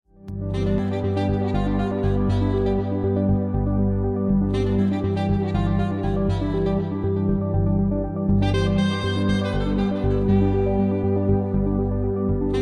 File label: New Jazz